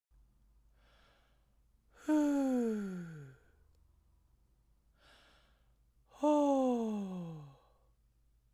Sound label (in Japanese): ファルセット